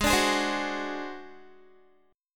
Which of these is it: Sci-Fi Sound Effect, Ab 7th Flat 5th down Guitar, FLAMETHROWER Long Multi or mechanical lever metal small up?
Ab 7th Flat 5th down Guitar